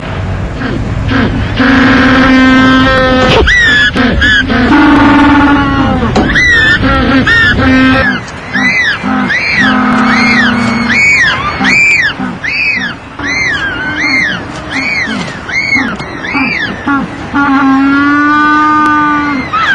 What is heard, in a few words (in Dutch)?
afrik, pinguins